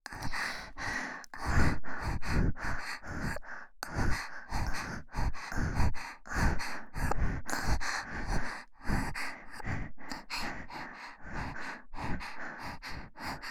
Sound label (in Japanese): 左右同時“喘ぎ吐息”